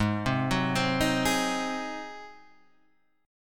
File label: G sharp 7th G sharp, Guitar Drop D